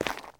zombie footstep concrete; DeadFright

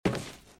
metal; firefight

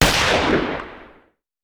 Hand Gun; Sound Ideas; Guns

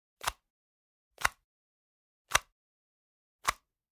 Generic Button Plastic Small

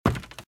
Footsteps - Wood - Creaky - Run - Left; RadwinIsland

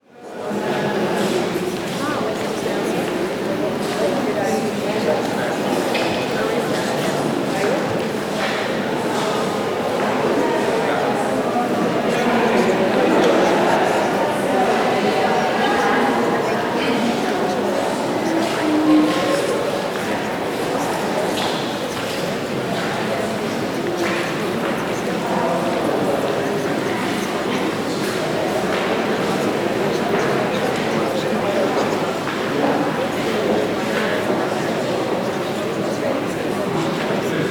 Crowd Indoor Light Crowd Large Hall; Walla; Sound Ideas; Walla Series

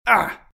hurt; RadwinIsland

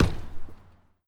Street Ball Glass Back

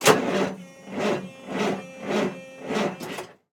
Semi Truck; Blastwave

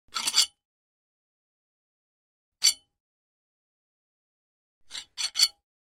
Sai Scrapes, Martial Arts; Hollywood Edge; Martial Arts and Human Impacts

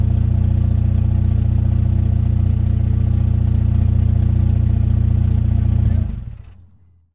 Jeep Wrangler Idle Off; Trucks

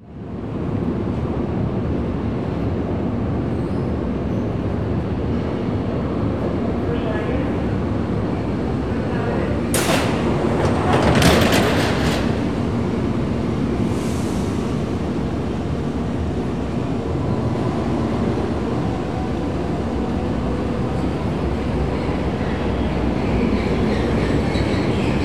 subwaystation depart; best service Blue Box

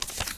Pickup Paper Note; Pickups

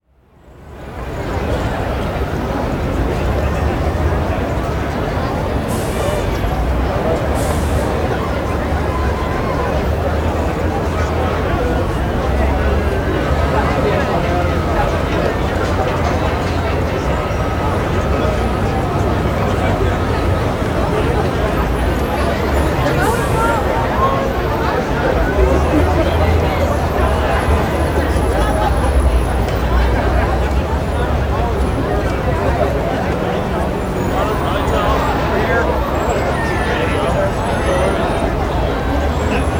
Crowd Outdoor Busy Downtown Crowd, Walla; Sound Ideas; Walla Series

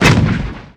Grenade Launcher Shot; Weapons

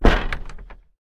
door close, household; NS Studios - ultra sound collection

